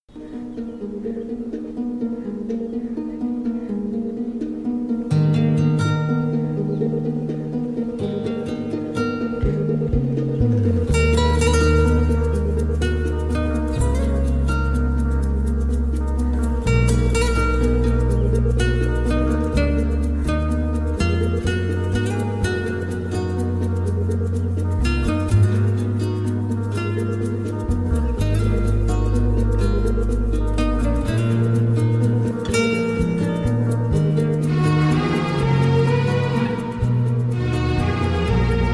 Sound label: Romantic BGM; Vikrams And Priya